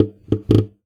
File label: Classical Guitar Extended Technique